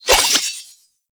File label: Spectral Sword Slash Start; Ultimate Abilities; Naramon; Warframe; Focus